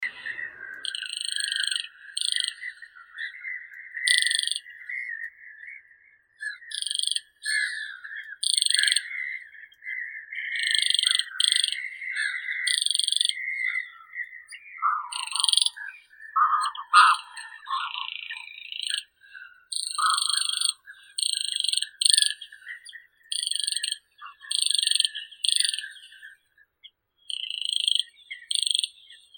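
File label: Baillon's crake, Porzana pusilla; pogonish kroshka; zvuki prirodi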